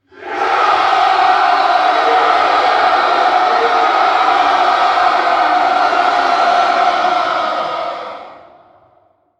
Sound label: victory cheer